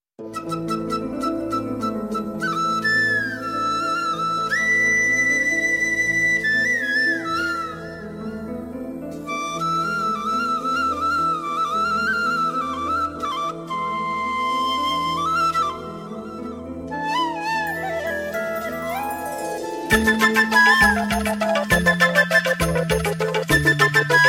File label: Fluit Music Tone